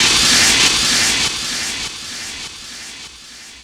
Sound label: Ambient FX, Ambience FX